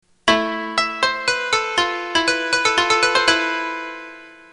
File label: opening koto